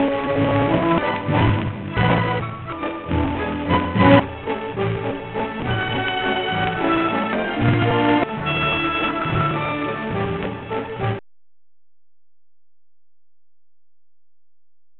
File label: classical distorted; Digital Distortion